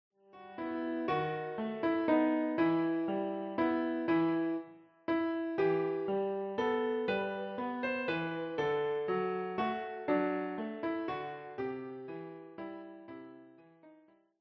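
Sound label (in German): Klavier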